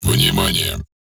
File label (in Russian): ВОСКЛИЦАНИЕ; Crysis2